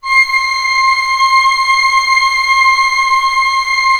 ORC C6 PP, Orchestra; Sound On Sound - Peter Siedleczek's Orchestra

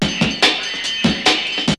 BREAKBEATS, TECHNO; Syntec; Wall of Sounds; TECHNO-BOX

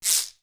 SCRAPE; East West; Global Sounds; D4 FXS

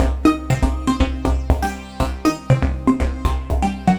Sinister Synth, Synth Textures; Sonic Foundry (Sony Creative Software); The Electronic Music Manuscript Collection

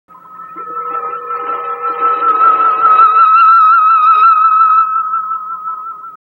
Ancient Music FX, Industrial

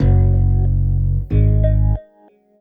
BASS, Hip-Hop & R&B; USB Soundscan vol.47; AKAI

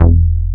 JNO06 BS E2; Drums In Your FACE!

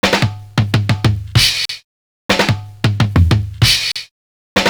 no verb fill; Univers Sons

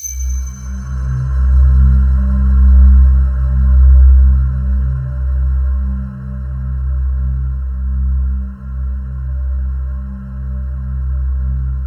C2, Synth Line

